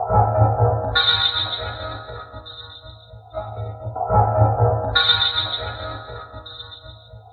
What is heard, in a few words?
Six Fathoms Guitar, Strings; Sonic Foundry (Sony Creative Software); Myths of Technology